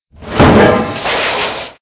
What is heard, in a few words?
Door Open; Five Nights at the Chum Bucket